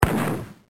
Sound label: MUSKET